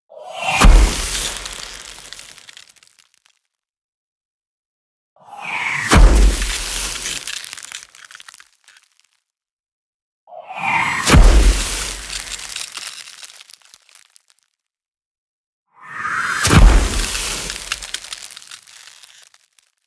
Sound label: magic; funny